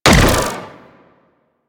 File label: Swarm Missile Fire; Weapons; Warframe; Sentinel; Helstrum